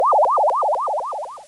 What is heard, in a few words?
Retro Game Weapons Sound Effects Space